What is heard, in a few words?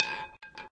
ball Soft Stop, european roulette; europeanroulette3